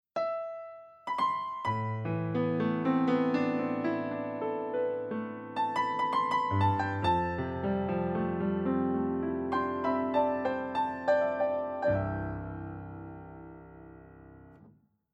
piano, instruments of the orchestra; Piano Sound

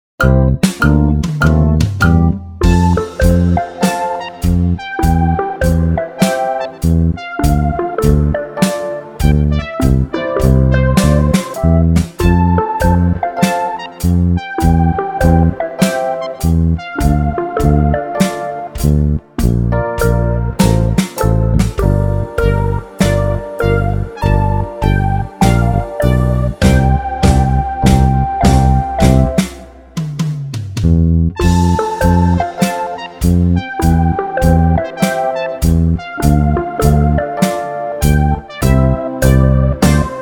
100 BPM 16 bar Swing Pop Happy

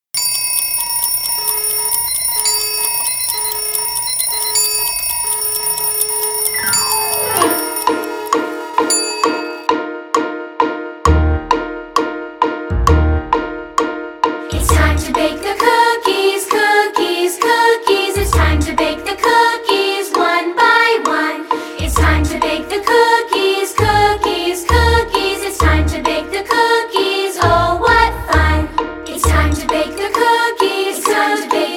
A Bakers Round Vocals

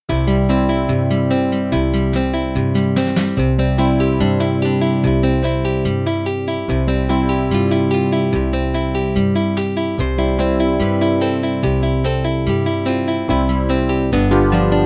acc; Christmas Secular

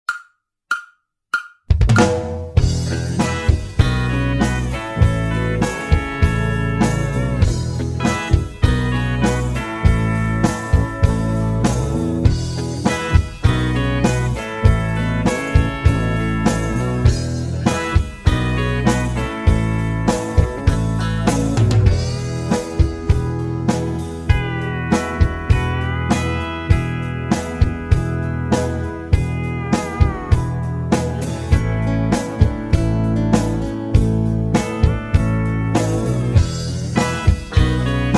96 BPM 16 bar Groovy Country

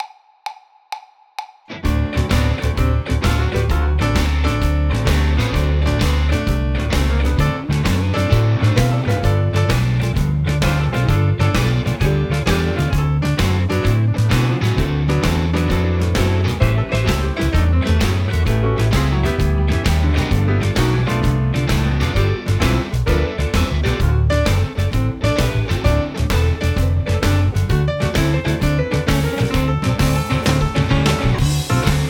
Texas Blues 130 BPM 32 bars

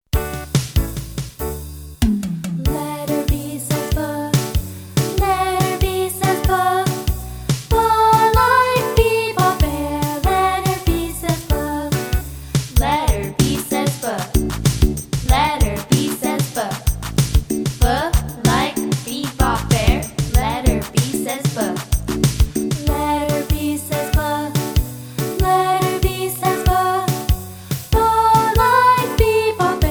Letter B Phonics Song

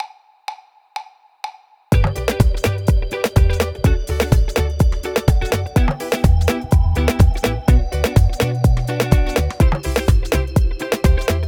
Canon in Reggae 125 BPM 32 Bars

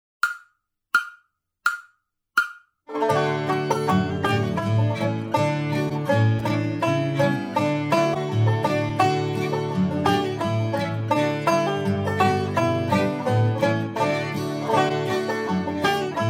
84 BPM 16 bar Folk

